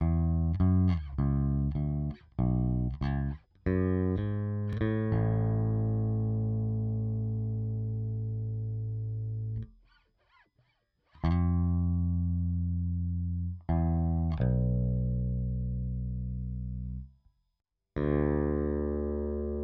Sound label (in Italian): Basso stacco